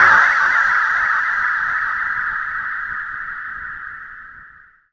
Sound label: D+B